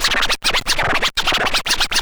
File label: SCRATC; Best Service; Advanced Mega Effects